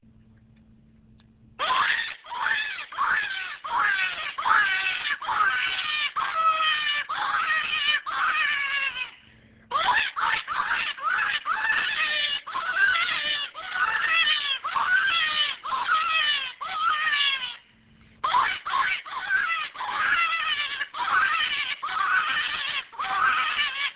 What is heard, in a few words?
Raspy Cottontail sound